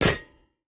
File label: footstep rubber boots metal jump land; Rust